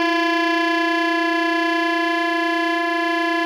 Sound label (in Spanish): Tremolos